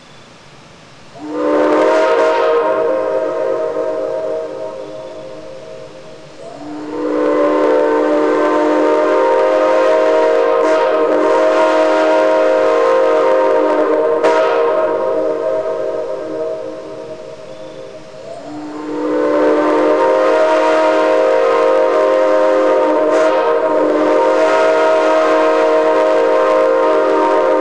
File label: 6 chime, steam whistles